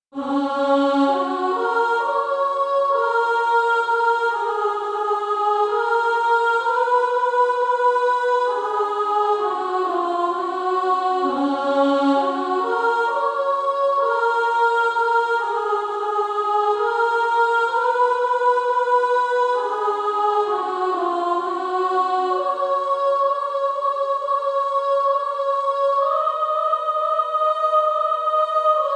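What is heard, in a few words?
Female Choir, soprano 2; On Christ the Solid Rock I Stand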